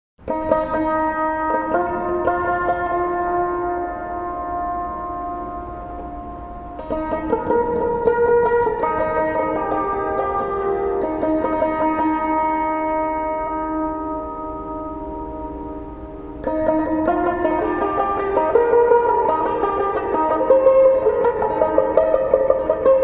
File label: Sitar Picking